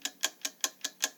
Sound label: clock ticking